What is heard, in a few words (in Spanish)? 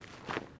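Pasos zapato cesped, shoe footsteps on grass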